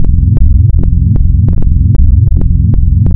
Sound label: Misc Smoothed, wavetables; Architecture 32